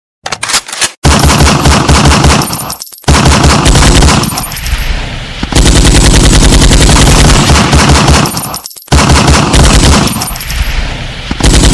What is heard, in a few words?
shooting sound